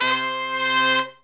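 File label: brass shorts, Brass Sections, Horns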